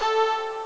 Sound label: VIOLA